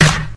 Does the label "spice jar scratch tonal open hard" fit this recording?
No